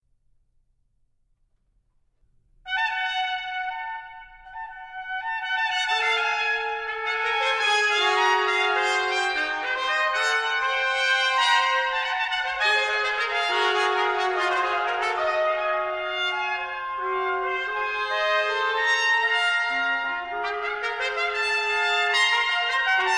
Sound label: timbered bells